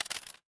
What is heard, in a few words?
impact coins